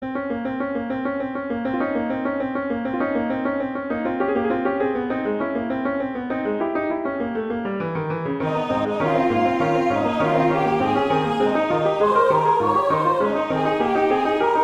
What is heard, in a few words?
Molto vivo; Mahler; Ruckert Lieder